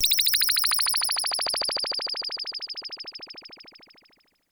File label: Prophet 5 FX; Greytsounds VOL-1